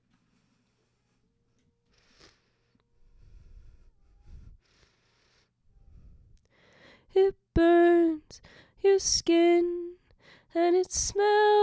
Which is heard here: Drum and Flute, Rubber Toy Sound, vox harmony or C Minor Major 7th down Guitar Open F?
vox harmony